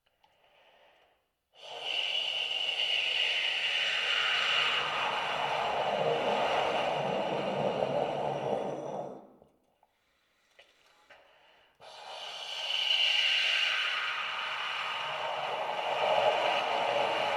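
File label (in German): bar, Luft-Ton, Übergang von hell nach dunkel auf drei Tönen: tief, mittel, hoch; »Tonleitern tonlos«; Übergang Luft -Ton auf einzelnen Tönen. Bariton; saxophon; spieltechniken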